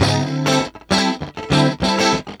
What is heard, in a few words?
Guitar Loop; Ambient Grooves